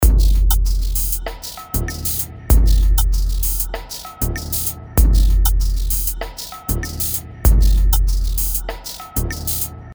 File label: Punchero 97bpm; Filmscore TV; Punch 2; rob papen